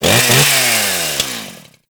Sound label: Ghoul Sawman Rev; Warframe; Grineer Ghouls